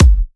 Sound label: Bassdrum; Vengeance Samples